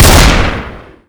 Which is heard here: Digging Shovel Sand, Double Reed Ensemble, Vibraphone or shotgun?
shotgun